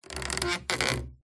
Stress Creak Squeak Groan; PMSFX

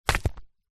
small rock drop forest floor; zapsplat; foley

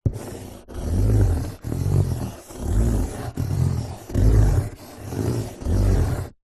office pencil strokes on empty cardboard box

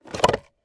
car cigarette ashtray open; transortation

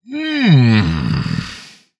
fantasy giant male say hmmm as in pleasure

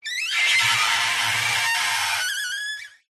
Wood Stress Creak Squeaks; DPA4060; pmsfx

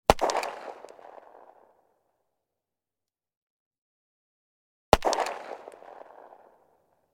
weapon gun smith wesson 642; still north media

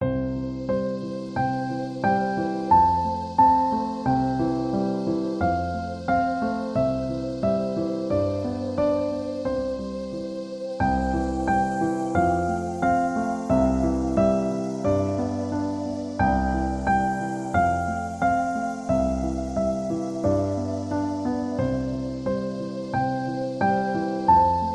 music twinkle twinkle little star 122; zapsplat

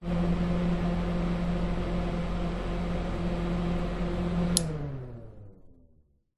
household fan small run short off

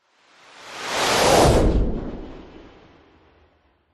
missile small launch designed

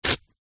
paper receipt rip; foley

